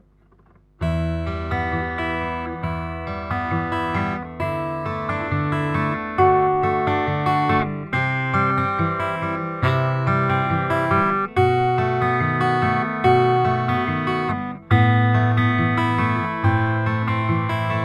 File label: yamaha ld 20